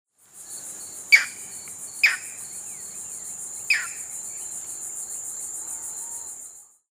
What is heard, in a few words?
Green Heron, Butorides virescens; wading largewater